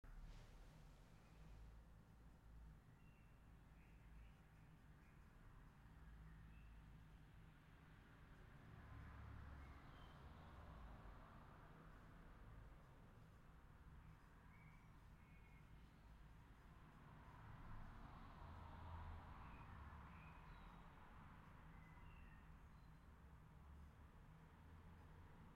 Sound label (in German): test Raum